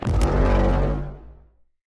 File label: plague whale elder death; minecraft